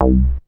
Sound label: BASS; SoundFactory; SMPMANIA